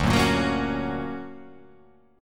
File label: D Augmented Major 7th down, guitar